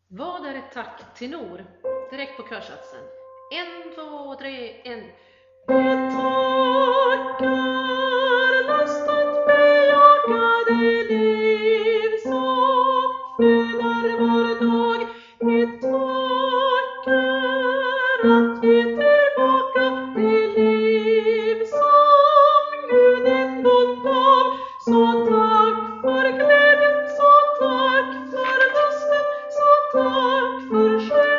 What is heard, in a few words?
tenor; Vad ar ett tack